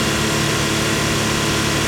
88 Pontiac Loop, Automobiles

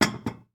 Plates Put Away, Kitchen; 1001 Sound Effects

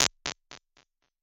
282 Delay, Impulse Responses; Reverbs; PastToFutureReverbs; SST; CLA; IRs

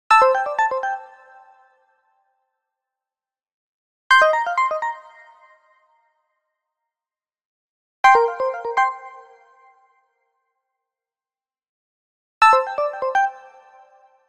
Arcade Jingle Positive

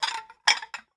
Plates Stacking, Kitchen; 1001 Sound Effects

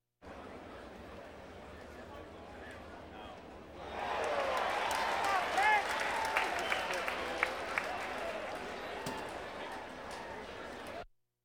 Crowds Race cars Baseball

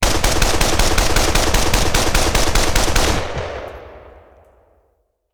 Machine Gun; Sound Ideas; Guns

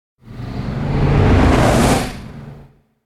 Whoosh Large Heavy Flying Away; Hollywood Edge; sound designer's toolkit v2

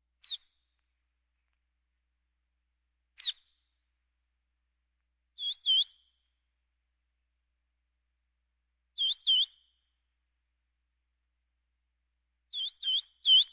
Birds; Valentino Production Sound Effects Library CD50

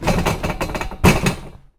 Metal Cart Rattle Hit; Foley - Rattles

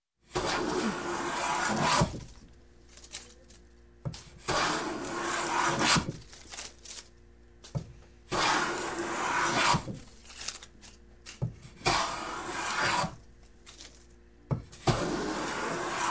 Construction, Plane Hand Plane Planing Wood; Sound Ideas 2000 Series

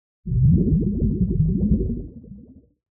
Bubbles Underwater Large - Submergence; Hollywood Edge; sound designer's toolkit v2

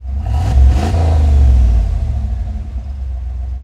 91 Firebird Rev, Automobiles

